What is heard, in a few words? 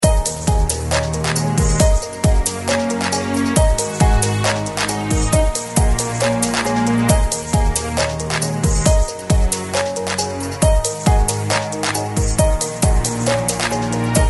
Ring, Ringtones; 3D Tones